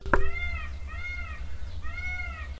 Peacock Noise

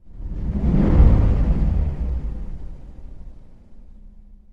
Engine Inside Car

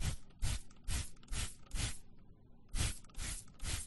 Spray Bottle Of Water

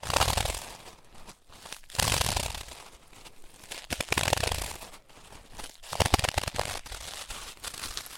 Climbing Mt Everest; snowflake

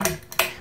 light switch pull chain; daniel simon

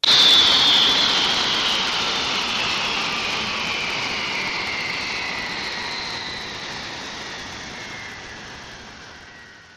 Power Down

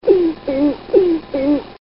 Grouse

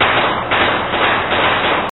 Gun Fight

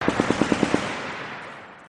Machine Gun Fire Distance

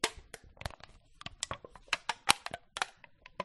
Crushing Can On Head

